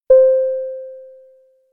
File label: ding